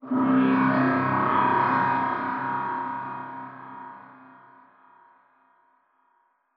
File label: strider distant; Half Life Alyx; combine; c17